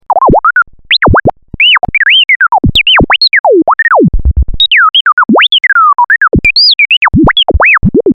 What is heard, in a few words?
Scratch